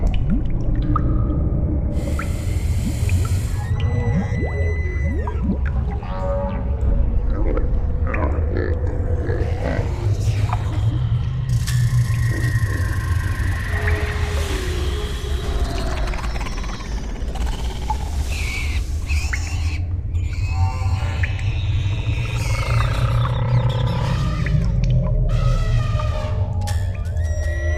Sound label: Ambient Cellar; Razoff's Cellar